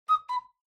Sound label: Cuckoo Clock Short